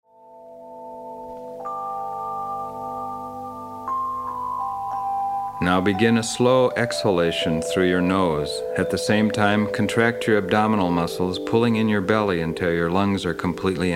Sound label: Humming Breath